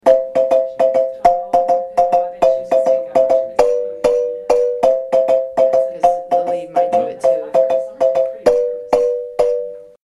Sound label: stutter chords, marimba